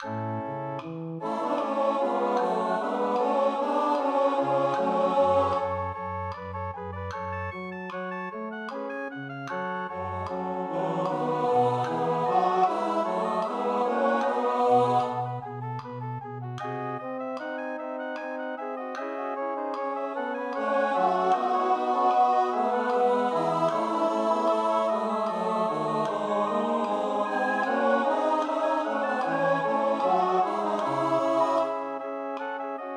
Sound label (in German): Ehre sei dir Schluss Tenor; Kantorei